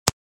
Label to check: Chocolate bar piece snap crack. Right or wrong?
Right